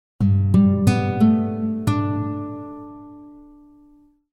アコギ OP1 short

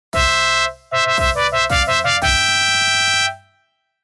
ファンファーレ; ゲーム向け

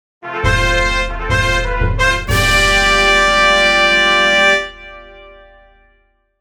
ファンファーレ

